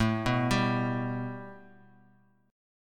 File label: A 7th Suspended 2nd A down; A7sus2 arpeggiated; A7sus2 chord